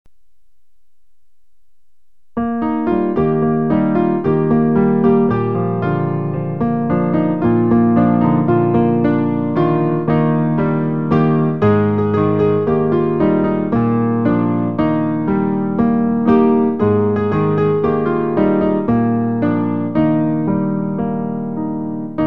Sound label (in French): piano